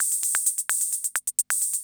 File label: CR78